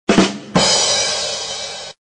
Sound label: badum tssss